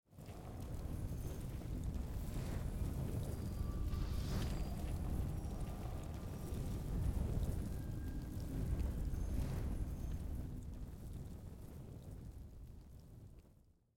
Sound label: hover; eternals